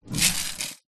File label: crumbs in metal tin roll on surface; food; golden gaytime; zapsplat